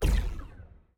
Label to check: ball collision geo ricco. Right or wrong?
Right